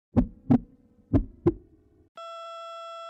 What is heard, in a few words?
low health restore